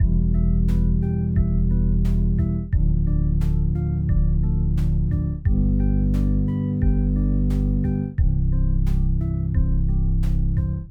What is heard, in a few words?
epic cinematic 88bpm loop